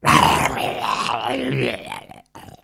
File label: Zombie; Blind Survival